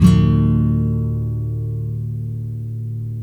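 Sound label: NYLON, E MINOR DOWN, CHORDS; Spectrasonics; Hans Zimmer Guitars VOL-1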